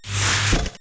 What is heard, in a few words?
Door Dwn; Treasure Galaxy (1994); Learning Company